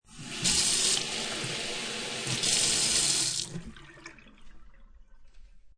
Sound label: Kitchen Sink Turn On Water Turn Off Water Flows Down Drain; Water Sound Effects